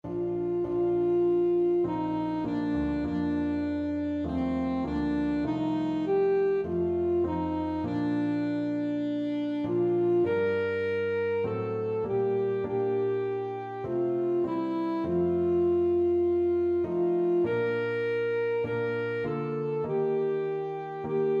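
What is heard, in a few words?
alto saxophone; it is well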